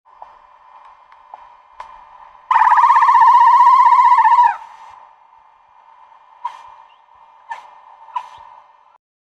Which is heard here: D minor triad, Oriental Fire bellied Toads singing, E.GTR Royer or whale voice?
whale voice